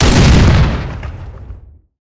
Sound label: fire, weapons; barrett